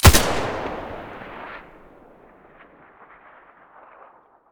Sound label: scar muzzle stereo